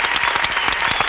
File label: crowd part 1